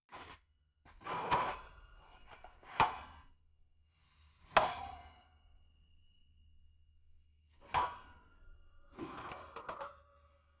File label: metallic shovel digging w; environment